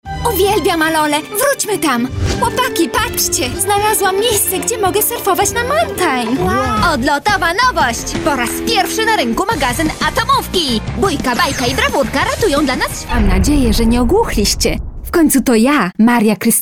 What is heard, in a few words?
POLISH Character Song